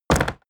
step walk wood plank creak; schritte

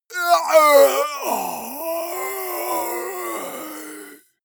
monster die; kreaturen

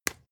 spice jar smack open short; industrie wirtschaft; gastronomie

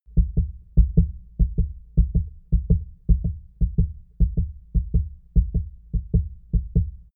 heart beat sequence

